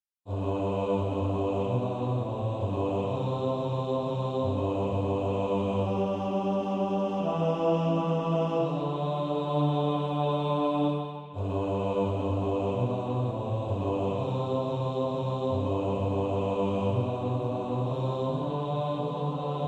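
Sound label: bass, practice music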